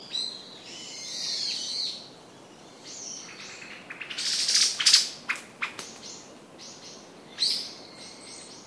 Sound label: Pale Thrush attention call